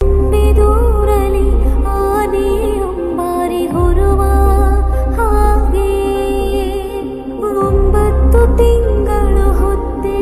Mother Sentiment Tamil ringtone; Kabzaa

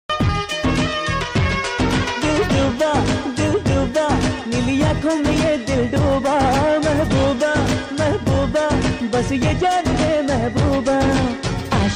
hindi ringtone

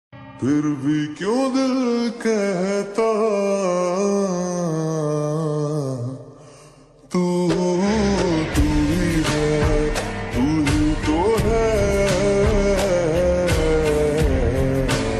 slowed hindi ringtone

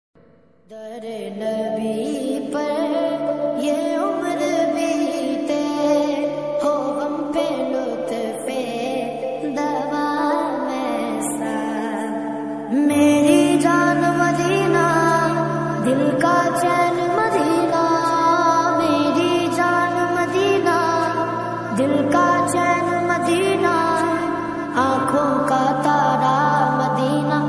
islamic Ringtones

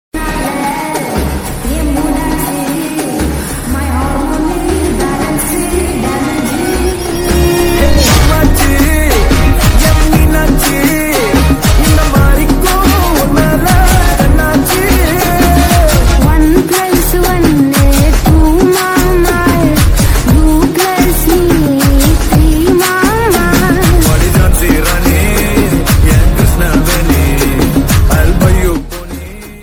slow reverb tamil ringtone

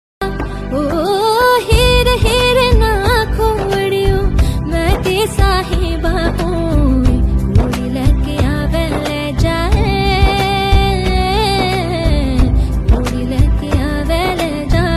panjabi ringtone

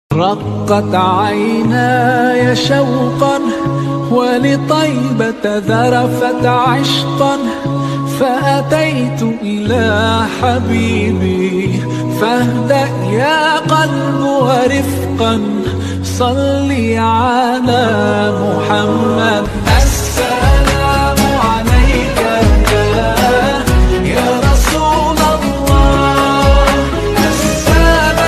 arabic ringtone

